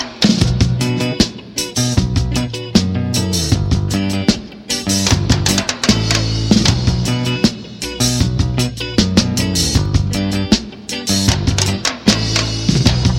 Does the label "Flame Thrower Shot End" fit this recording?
No